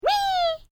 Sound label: Created Creature Small Call, Alien Sound Effects